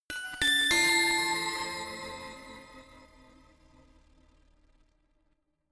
notification glockenspiel crazy triad up diminished; instrumente